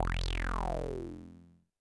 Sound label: Roland TD-20 Percussion